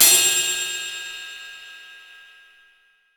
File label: Pearl Bell, Drum Hits; Zero-G; Soundclash